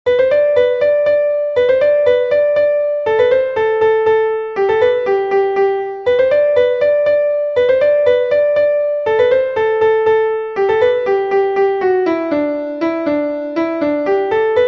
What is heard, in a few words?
Bourree; Grandes Poteries